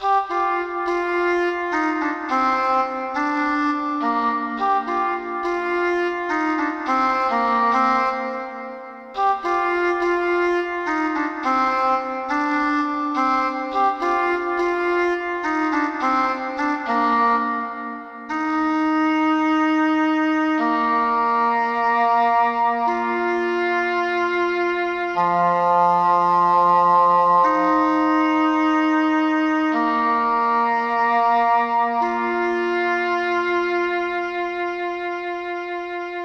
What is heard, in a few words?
Flute